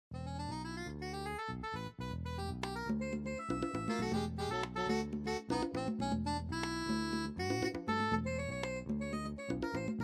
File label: Sax jazz sax